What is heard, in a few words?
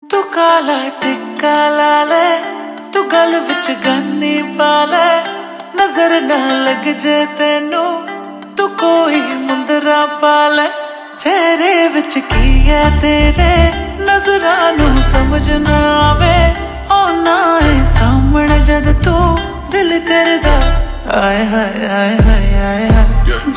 Ringtone Slowed Reverb